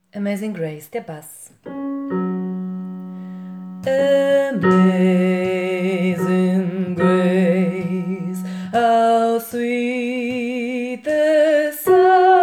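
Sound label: Amazing grace bass, gospel choir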